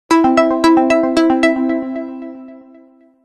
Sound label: Alarm